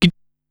"Chhl", Korg Wavestation-SR Drum Kit

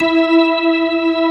organ E4; AKAI S-Series CD-ROM Sound Library VOL-8

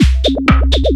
Beats, Synchro Funk; Tekno; Sonic Foundry (Sony Creative Software)

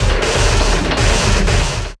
Mole Bumpers, Fx; Sonic Foundry (Sony Creative Software); Esoterik Beatz

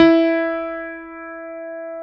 CP70

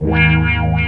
Wah Trem; Mo' Phatt; Digital Sound Factory Mo' Phatt

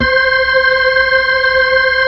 B-3

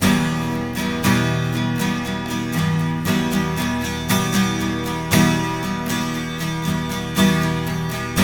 12 STR MIN F, TWELV; Spectrasonics; Hans Zimmer Guitars VOL-1

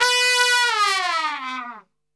B 2, Brass; Best Service; Brass Super Section; TRP LNGFLL

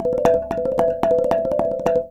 RANATTHUN; Spectrasonics - Heart of Asia CD2

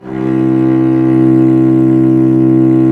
STR VC.C, Roland LA Composer strings; Roland - LA Composer vol. 1

